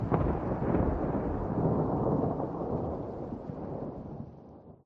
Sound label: thunder rumble; SFX